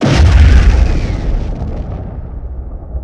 Explosion Large 2x Low Rumble

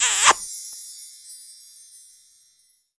Kiss; Knight Online

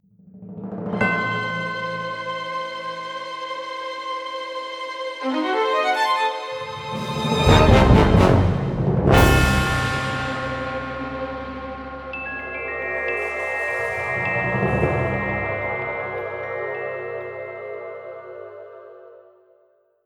Spooky

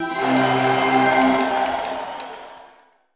Bonus Round Win

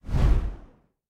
whoosh

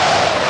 Winter games; Sfx Wintergames

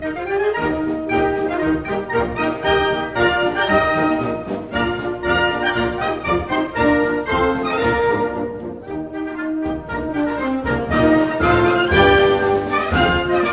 BGM Week Reward

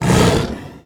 Monster Attack; Monster Ralph Attack

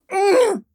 Mantis Lord Death; Hollow Knight

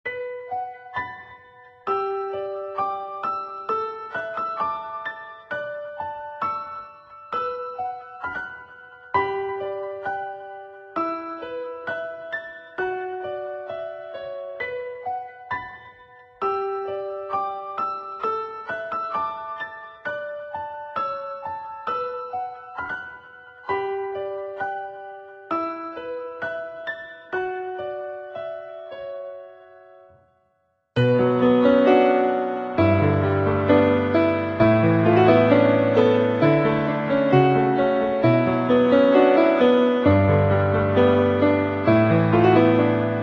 Piano Sad Story; gamerip; menherafflesia flowering abyss; android ios mobile switch windows

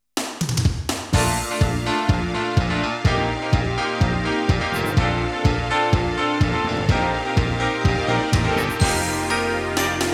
Ending; Sorcerian; TurboGrafx-16

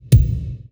bassdrum

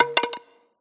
Sfx Baseball

